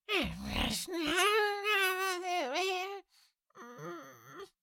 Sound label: Cartoon little monster voice grunt growl